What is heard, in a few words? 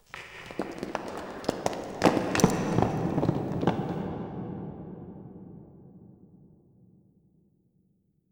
Rocks; Inquisitor 3